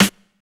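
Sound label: Hip Hop R n B snare drum; USB Soundscan vol.19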